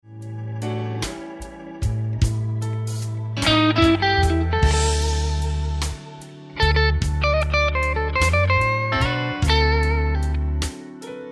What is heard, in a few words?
Lick, guitar